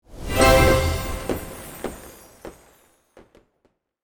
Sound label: Win jingle success victory